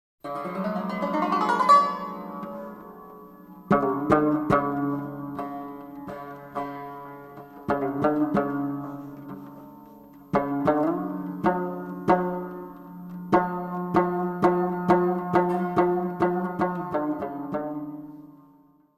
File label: rabab